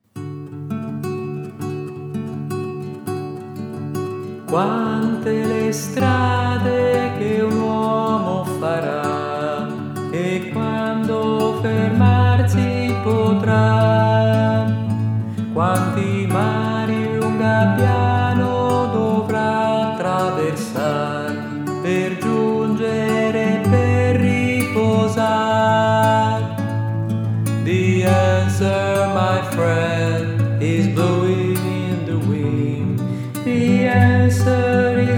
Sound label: tenor